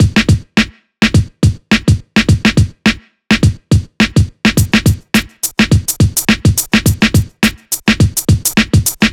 105, Breaks; loops; 105 BPM; Loopmasters; Jon Carter & Alex Blanco; Block Party Essentials

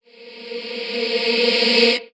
FX Misc

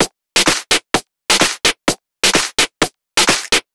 Loop, 128 BPM

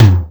Toms

